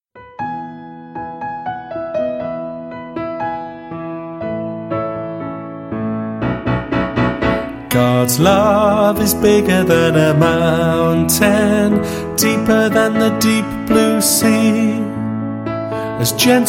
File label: Vocal